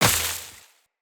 Step Veg, Footsteps, Walk; Characters; Tenno

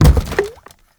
Gas Tank Impact; Gameplay; Warframe; Nineteeen Ninety Nine; PvPvE

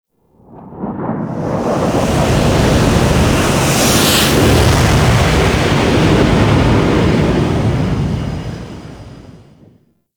Gas City Space Fighter Approach; Warframe; Corpus

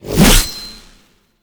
Hatchet Whoosh; Warframe; Corpus; Venus Hatchet

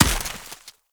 Snow Ball Impact; Warframe

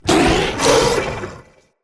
Corrupted Battle Dog Attack Bite Double

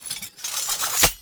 Idle Sword Twirl

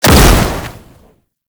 Hellfire Projectile Explosion; Enemies; Abilities; Warframe; Tau; Roathe

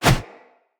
Husk Whoosh, Unarmed

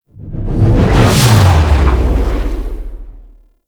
Death Fade; Arthur; Warframe; NineteenNinetyNine; Stage12PartyOfALifetime

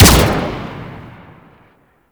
Arch Dual Pistol 3D Fire; Warframe; Archwing

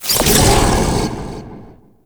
Shrine Assemble Frag Deposited; Warframe; Duviri Murmur

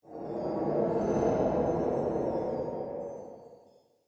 Ancient Wind; UnderCity

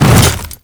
Hammer Boss Step Ice, footsteps; Warframe; Grineer Hammer Boss

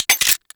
Gunblade Mech

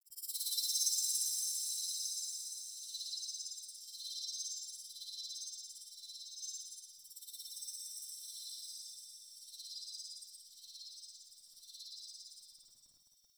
Touch Pulse; Orbiter Nursery; Cinematics

